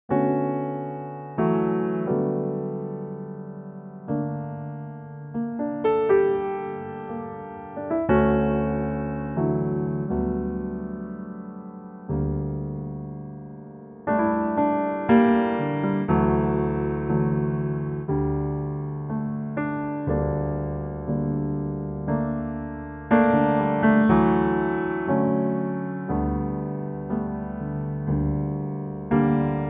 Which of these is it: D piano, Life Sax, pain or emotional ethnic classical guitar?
D piano